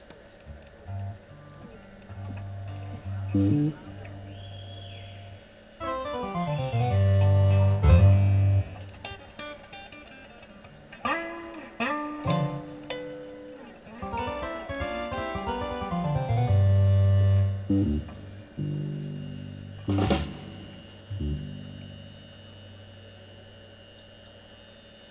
Tuning

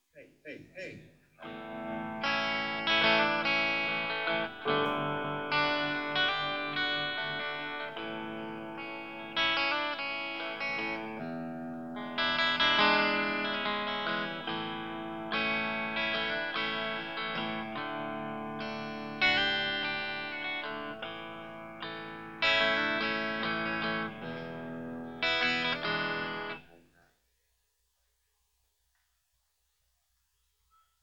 Guitar Soundcheck

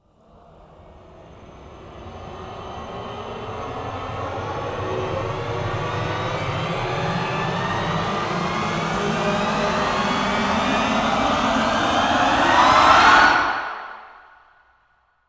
Screamer, Rises and EFX, трейлерная музыка

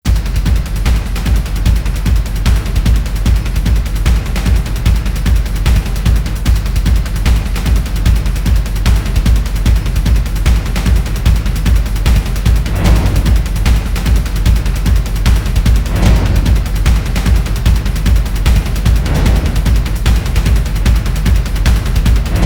Drums; трейлерная музыка

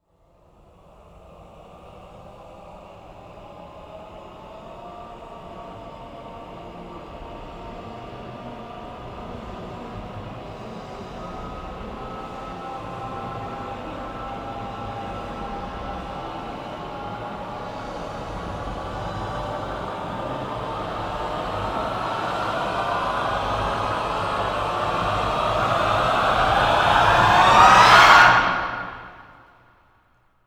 Sixty Voices Rise

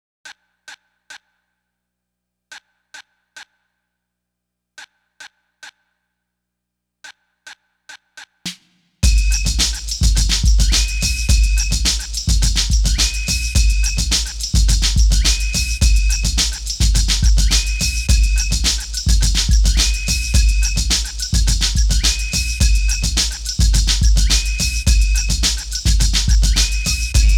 Bonus Beats

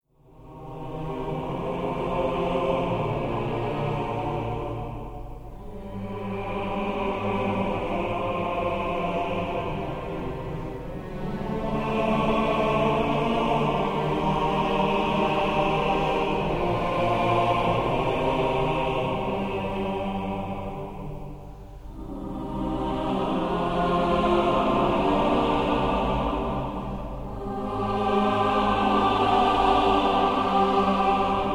Choir; Abbey Road

